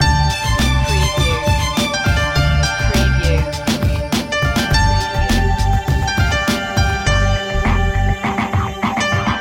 loop; flying

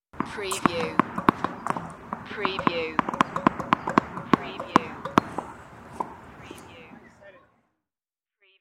Tnns Ball Bnce; tennis; sports games; tennis table tennis

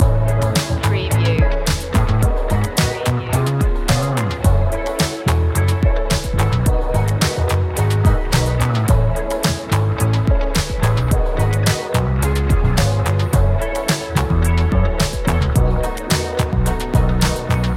loop, pop, funk

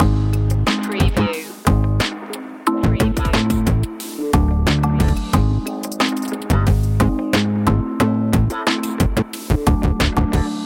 freaky game loop, chip tune

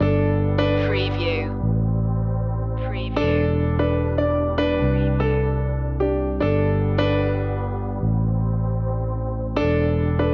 start new day loop, Country Ballad; Short edit for looping. A care free and mellow light rock / pop / country trac

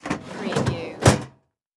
File Cabinet Close; Sound Effects Bible

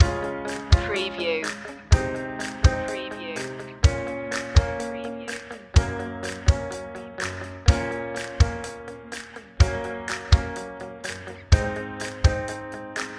big dreams stinger, Breezy Inspiring No Drums

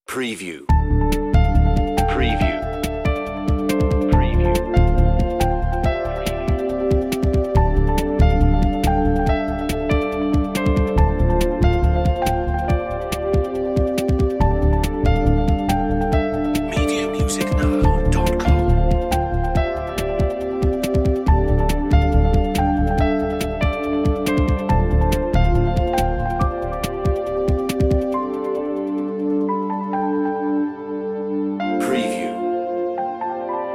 Reflective, on-hold music

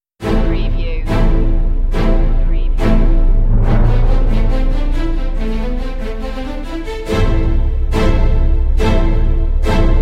benedictus orchestral, tension drama

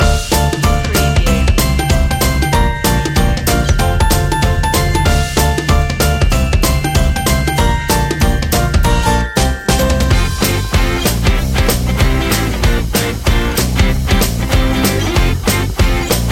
Upbeat Rock Loop

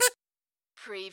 comedy, Button / Multimedia Sounds

